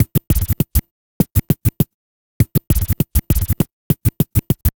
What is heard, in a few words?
Vinyl Scratch Kick